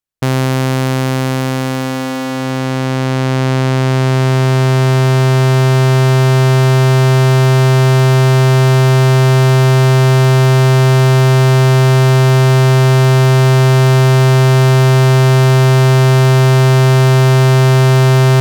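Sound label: waveforms triangle saw saw square; toraiz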